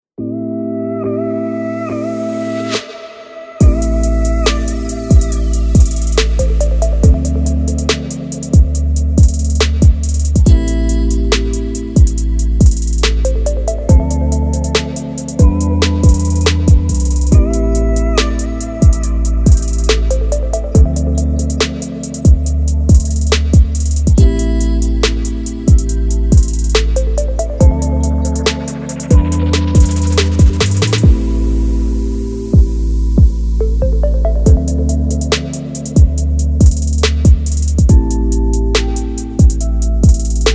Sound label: chill trap; MIUI12